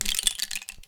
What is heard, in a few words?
Rain Stick, Percussion Samples